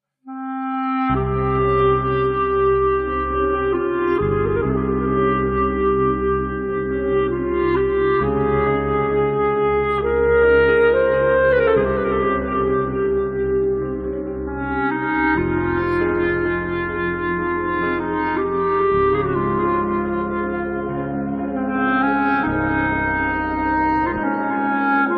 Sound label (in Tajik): minusi grustnaya muzika; minusi kinoi